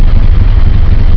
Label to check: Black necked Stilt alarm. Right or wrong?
Wrong